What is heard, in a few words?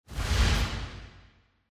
roll